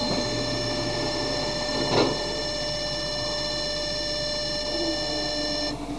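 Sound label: Door Close, Train; TW6000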